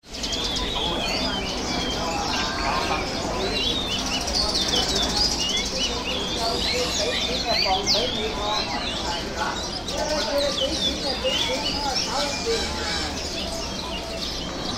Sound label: Hong Kong Bird Market Ambience